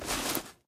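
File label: inventory items cloth; STALKER; IWP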